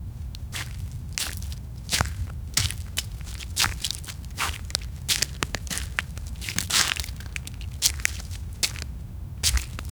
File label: footsteps in squishy mud